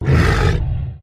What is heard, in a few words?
attack bottom